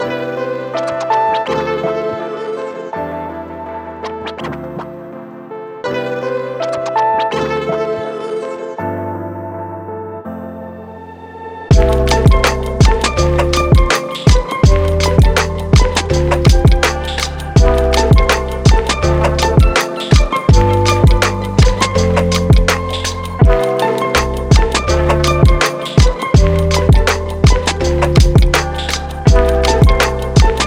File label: lofi meditation